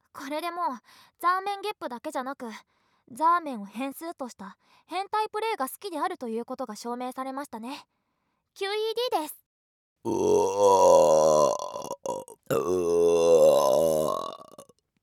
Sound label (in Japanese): ザーメンゲップ2連発！！; ザーメンゲップ集